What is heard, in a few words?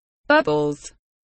bubbles